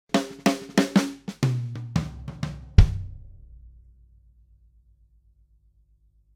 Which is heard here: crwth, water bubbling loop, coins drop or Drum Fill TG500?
Drum Fill TG500